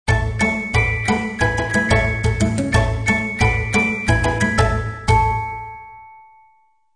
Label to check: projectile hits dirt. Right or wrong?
Wrong